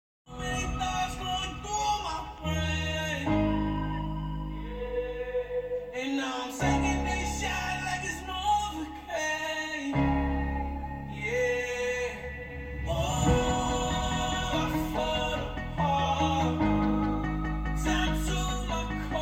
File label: Fall apart trap